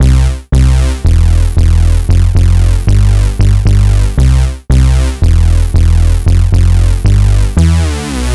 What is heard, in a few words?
Bzzzz Bass